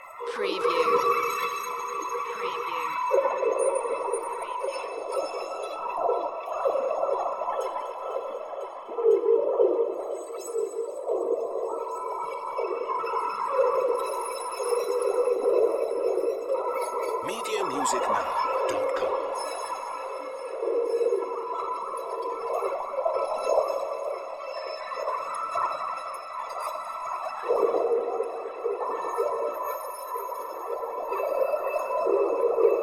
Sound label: magic whisper background loop